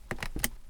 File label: Click Seatbelt